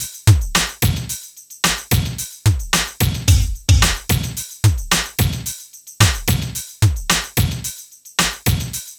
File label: HOUSE, Funky; INIS